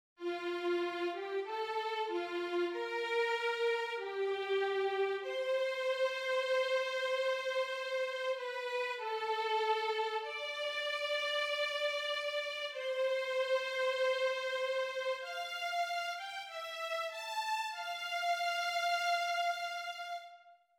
VIOLON; ANAMNESE St Jean

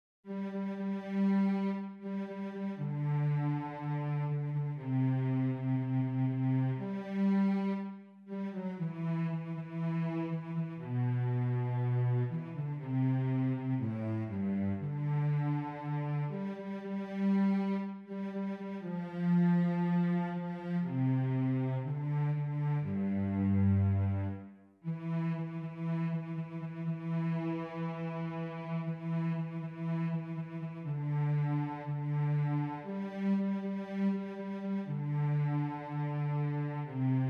VIOLONCELLE; Jubilez Criez De Joie

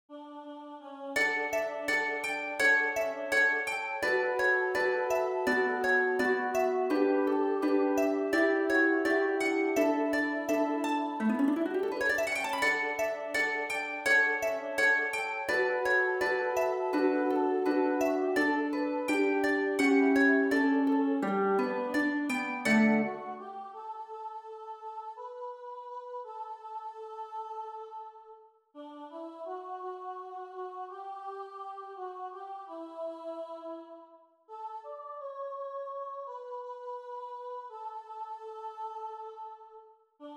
Celeste Jerusalem HARPE

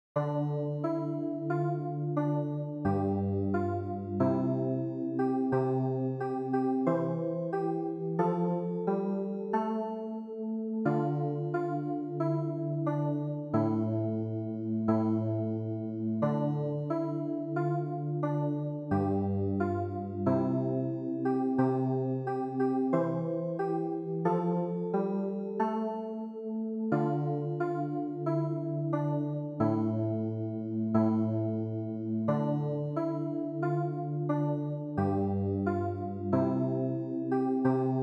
CLAVIER; AGNUS St Jean

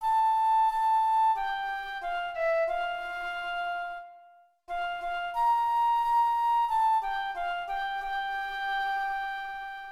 FLUTE; Tu Es Pretre a Jamais

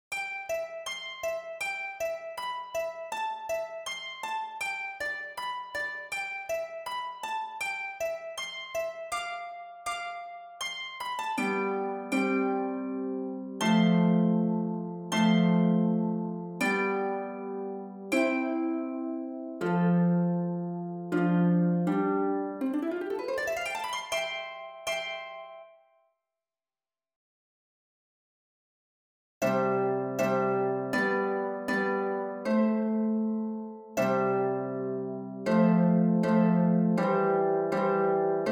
HARPE

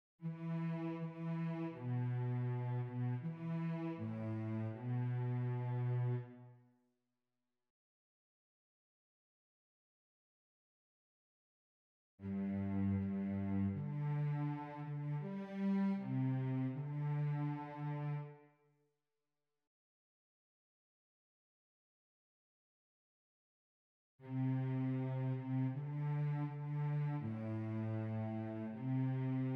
ANAMNESE VIOLONCELLE

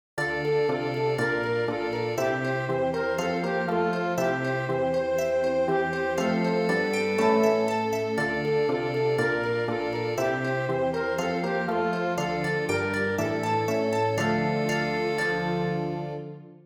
ORCHESTRE

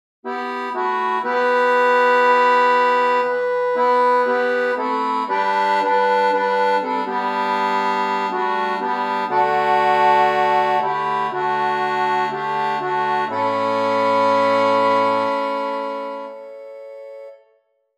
ACCORDEON

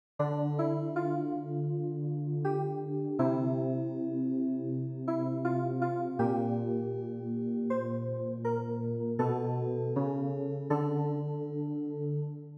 clavier; Garde Moi Mon Dieu

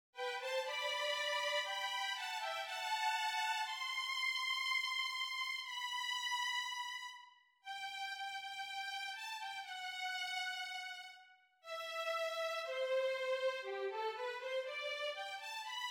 violons

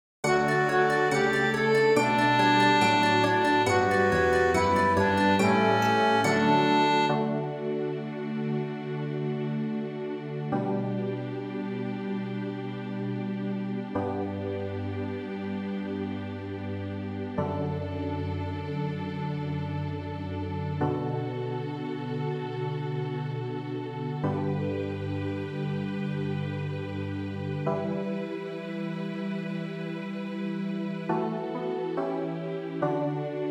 orchestre; Psaume88

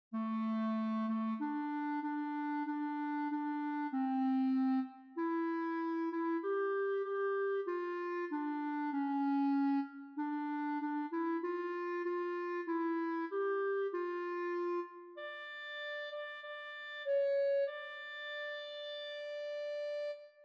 CLARINETTE; ANAMNESE St Jean

